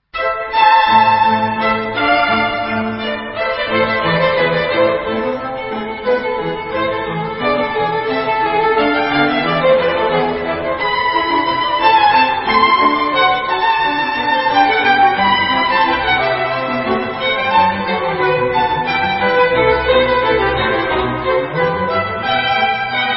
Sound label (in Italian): Bach violino concerto